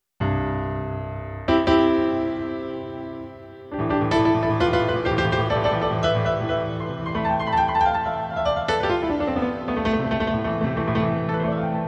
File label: piano